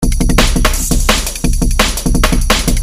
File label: loop, breakcore